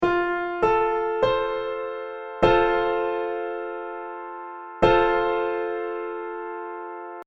Gt triad F

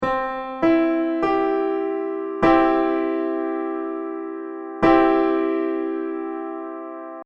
minichord C

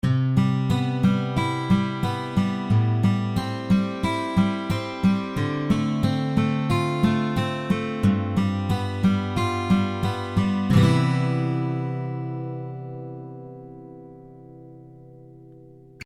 Gt arp sample

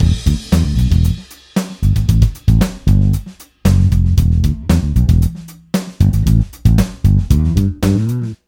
Drums Bass mix

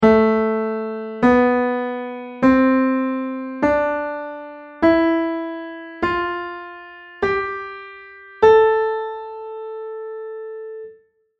Gt A minor scale

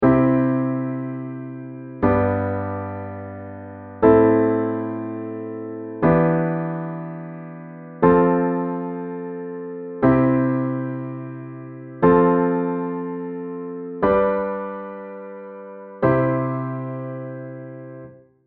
minichord

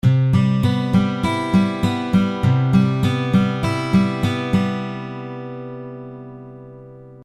Gt arp sample

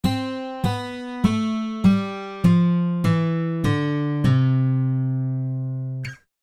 Gt do si la

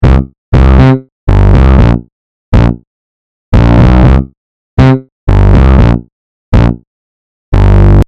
bass f2

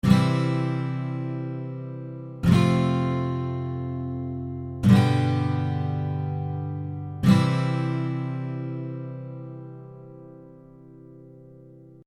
Gt C F G C

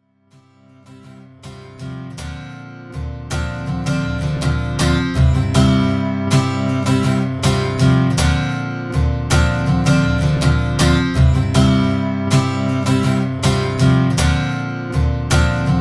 fade in out

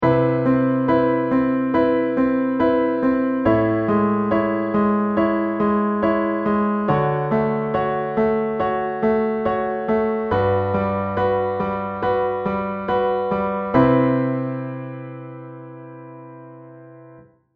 Gt C major

